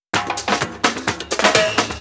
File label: Fill 128 BPM; Vengeance Samples; VEH1 Loops